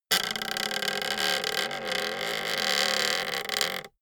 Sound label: wood creak slow polyphonic